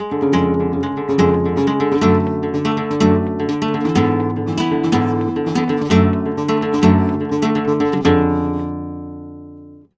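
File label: arpeggio guitar, Flamenco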